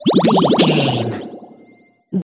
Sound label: potion bubble effect brew